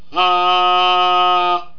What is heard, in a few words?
resonator ah